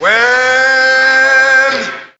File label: well, SUNG